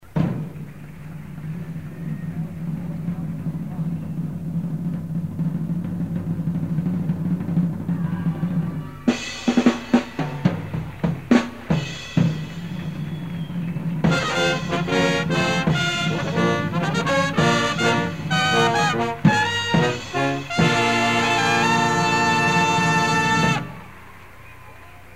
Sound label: Fanfare; The circus performance was recorded by Robert P. Hills, Jr. on August 1, 1973